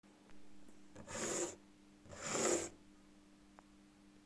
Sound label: scratch on door